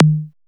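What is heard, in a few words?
RES TOM, analog toms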